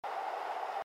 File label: Vent; android; Troll Face Quest Unlucky